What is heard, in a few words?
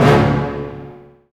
HIT ORCH.; Roland - Brass, Strings, Hits and Combos; Orch.Hits 1